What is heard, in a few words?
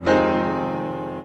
chord